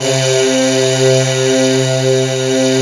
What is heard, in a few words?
GIRL SH, FAIRLY VOX; Synth